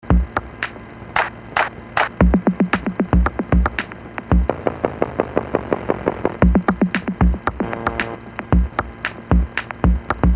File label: city rain set